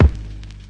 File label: atmospheric dirty kick; Kicks; single hits